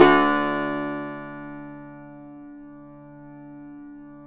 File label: yayli tambur; Spectrasonics; Hans Zimmer Guitars VOL-2